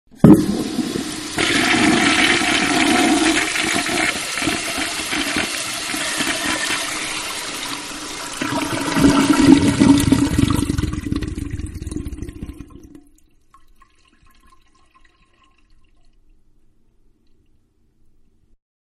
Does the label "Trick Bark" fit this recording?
No